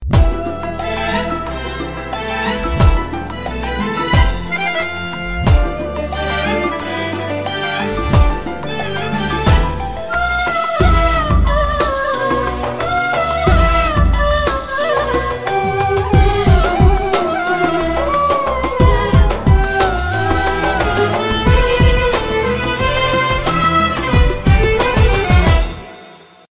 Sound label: egyptian ringtone